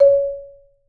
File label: MSOFT C#4, Marimba; Sampleheads - Dave Samuels Marimba & Vibes